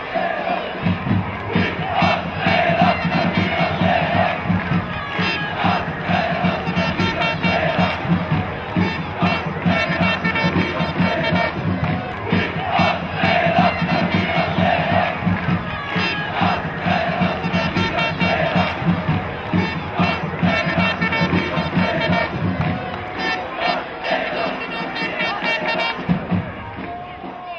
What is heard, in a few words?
chant, ireland; galway united